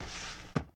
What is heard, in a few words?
Employee Stock Shelf